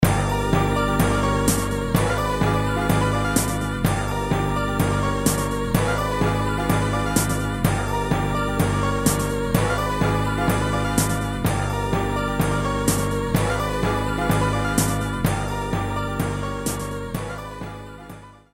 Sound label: music victory; blackheart